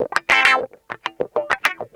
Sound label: GTR